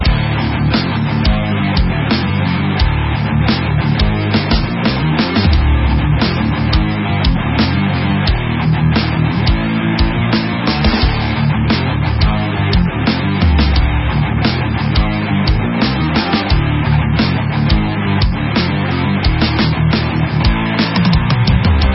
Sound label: background battle; heroes saga android